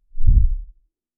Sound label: menu open and close whoos